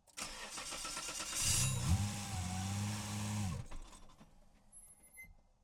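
nissan r33 skyline onboard idle start try fail engine left; dpa4061; Sonniss GDC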